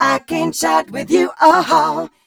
f mel e flat, fem Vocals

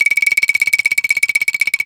ANGKLUNG C5; Spectrasonics - Heart of Asia CD2

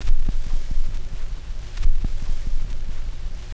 VInyl Noise; Houseworx

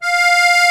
AKORDEON F 3, ACCORDION

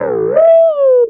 Ambient FX, Ambience FX

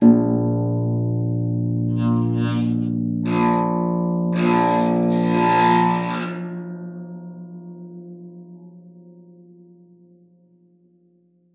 PEDX A1, harp; Xsample VOL-8; HARP+FX

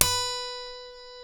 C#, funk guitars

